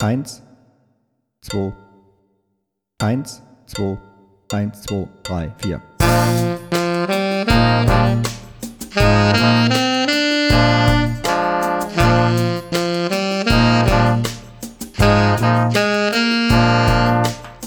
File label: Sax Solo